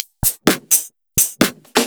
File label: Electro Loop 128BPM; Vengeance Samples